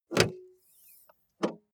car bmw e46 exterior bonnet open short

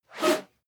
whoosh whistle

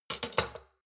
shot glasses stack soft short; soundpool

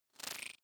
sci-fi expand; sweeps-swells

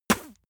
spit; soundpool

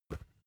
basketball catch light; sport-freizeit

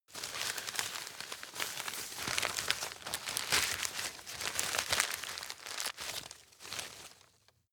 rustle sequence, foerster; berufe

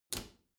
bathroom cabinet close soft; objekte; tueren

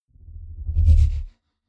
sci-fi vehicle drive by metal wobble fast; raumschiff

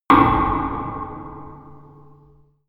metal rail

